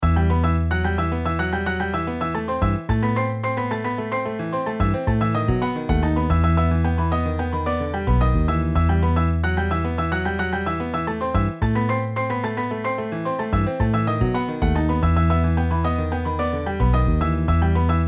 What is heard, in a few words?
Piano Bass MIDI